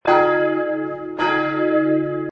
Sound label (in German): glocke es1